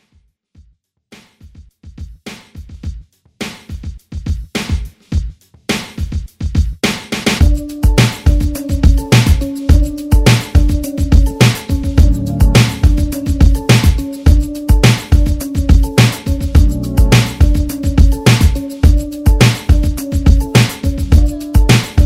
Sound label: Pop Redrum